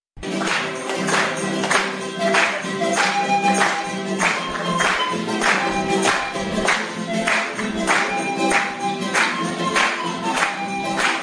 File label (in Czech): Novy zivot bohosluzba; Video Ekvador